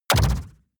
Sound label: sci-fi impact short flutter